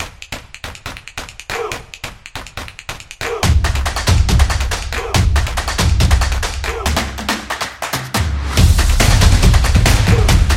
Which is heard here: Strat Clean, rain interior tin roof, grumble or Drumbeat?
Drumbeat